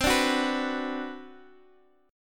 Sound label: B Minor C; Bm/C strummed; JGuitar